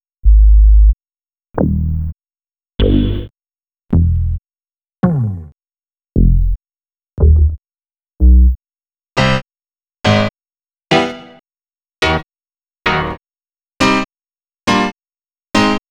bass + piano, ravers; X-Static Goldmine; ravers (chains)

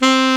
SAX A. MP C, Alto Short; Roland LCDP07 Super Sax

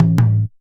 JUNJUNBA HIT; Best Service; World Colours

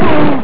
ough; Amiga Soundtracker Sample Packs

